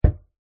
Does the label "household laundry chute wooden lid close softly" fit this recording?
Yes